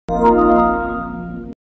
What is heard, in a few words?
playstation message sound